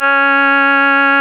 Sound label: REED C#; Big Fish Audio; Synclav FM